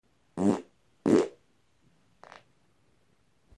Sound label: Super Fart